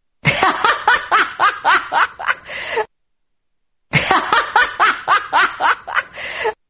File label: Cackle; Hillary